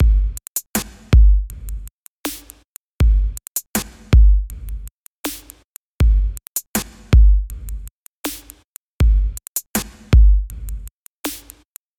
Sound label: beats